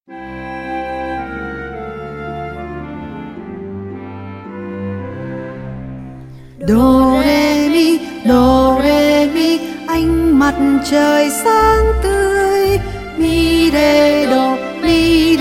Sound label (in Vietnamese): Dieu Waltz